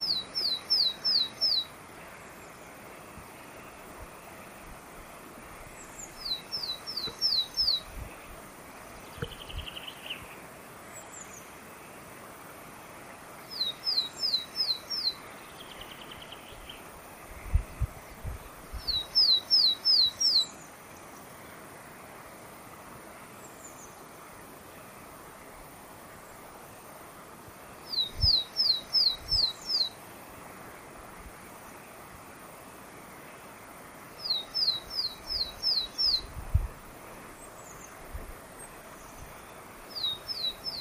Weidenmeise; Ornithologie; Mittelneufnach

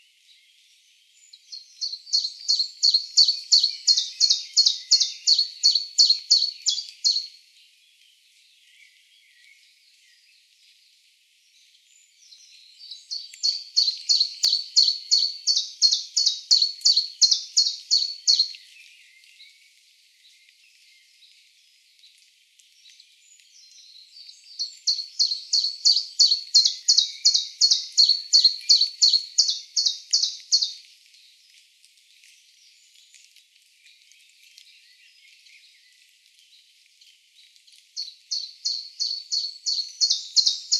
Zilpzalp; Ornithologie

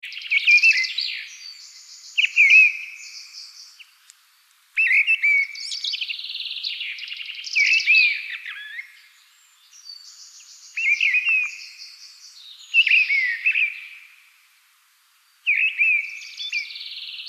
Misteldrossel; Ornithologie

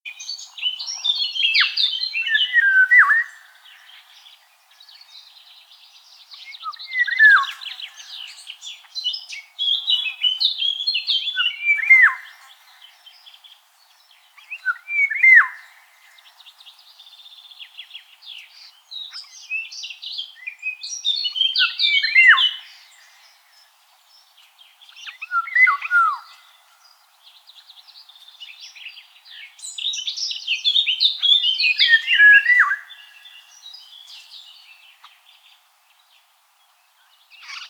Pirol; Ornithologie

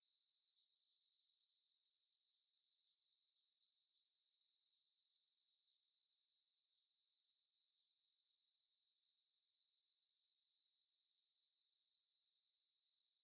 Eisvogel; Ornithologie

